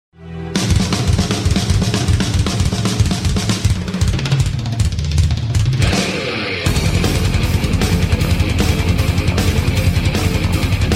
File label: Fast Drums